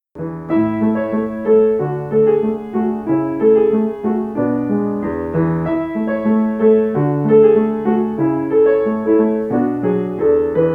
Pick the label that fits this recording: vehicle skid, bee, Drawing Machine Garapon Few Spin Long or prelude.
prelude